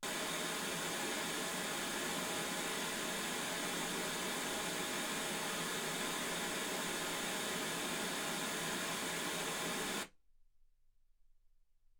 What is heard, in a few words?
wgn 48kHz